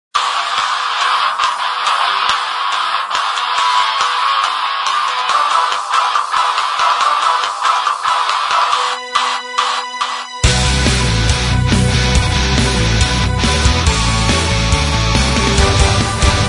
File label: Symphonic Metal